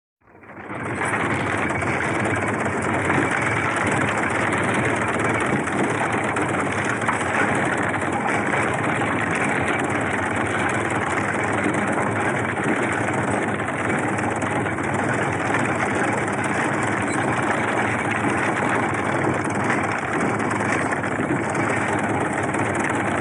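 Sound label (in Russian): Сноповязалка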